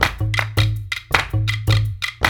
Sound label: World Dance; OZ!